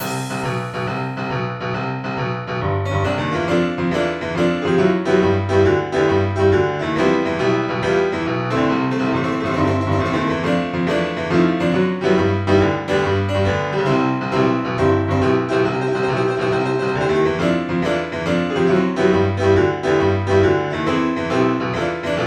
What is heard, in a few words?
pub; Arvale